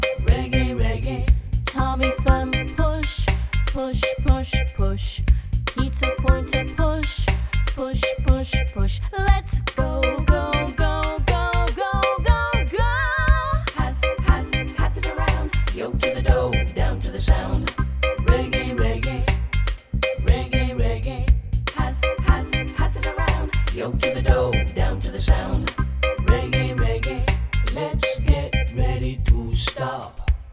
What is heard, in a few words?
Reggae